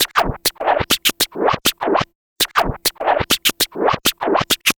Vinyl Scratch Clap

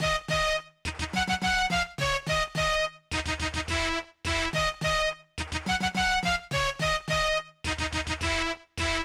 HipHop Synth D#Min; Digidesign; Pro Tools Essential Loops

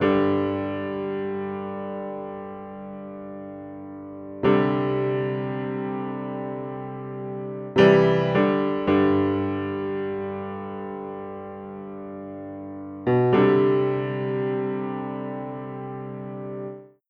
Country Piano Verse; Digidesign; Pro Tools; Pro Tools Essential Demos; Essential Loop Demos; Demo Session - Country

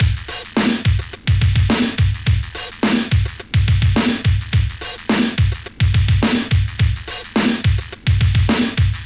Hard HipHop Drums; 106; Pro Tools Essential Loops; Digidesign

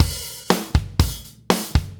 120 Solid Rock Drums, Fast Tempo; Pop Rock; Digidesign; Pro Tools; Pro Tools Essential Loops

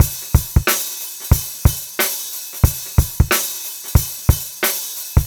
091 HipHop Live Drums; Digidesign; Pro Tools; Pro Tools Essential Loops

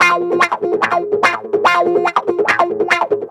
145 NuJazz Wah Guitar Amaj, Jazz Guitar Loops; Pro Tools Essential Loops; Digidesign

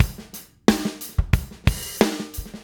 090 Pocket Drums; Pop Rock; Moderate Tempo; Pro Tools Essential Loops; Digidesign

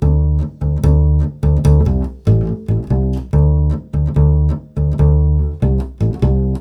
145 NuJazz Bass D maj; Pro Tools Essential Loops; Digidesign

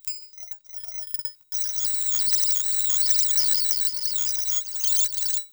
Alien Anthem bass

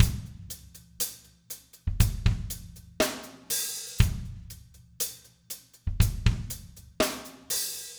060 Ballad Drums Verse; Pop Rock; Slow Tempo; Digidesign; Pro Tools; Pro Tools Essential Loops

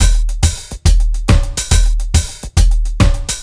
Dance Beat; Dance DJ; Drums; Beats; Pro Tools Essential Loops; Digidesign

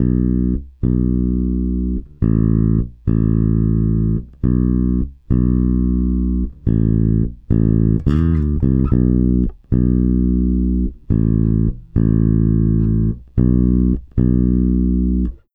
Country Bass Bridge; Digidesign; Pro Tools Essential Loops

